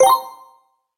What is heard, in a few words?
confirm